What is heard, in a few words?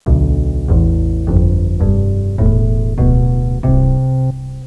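double bass; Music Game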